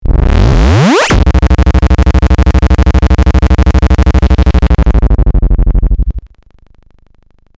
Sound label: alien attack